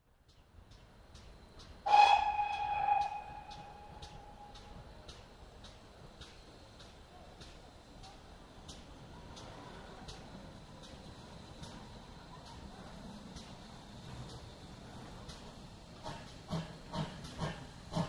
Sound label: train approaching